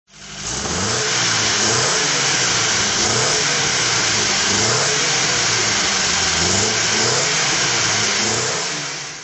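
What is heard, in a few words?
Sunbeam Alpine mk2 throttle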